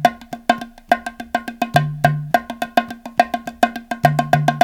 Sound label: SIMPLY PERC; Crypton - DrumBase5000